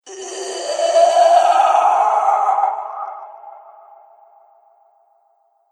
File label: Surreal Horror Pain Sound; Evil Sound Effects; Andrew Fleming